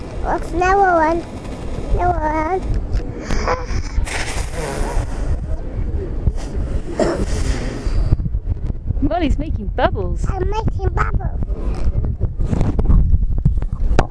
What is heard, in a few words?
baby makes bubbles